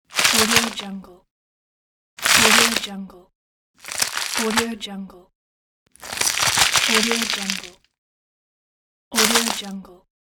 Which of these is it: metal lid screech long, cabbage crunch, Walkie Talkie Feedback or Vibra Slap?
cabbage crunch